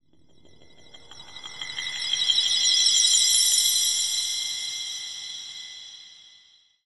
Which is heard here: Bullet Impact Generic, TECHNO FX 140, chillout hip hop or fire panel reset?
TECHNO FX 140